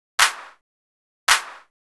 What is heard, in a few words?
Snare, Trance